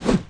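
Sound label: weapon axe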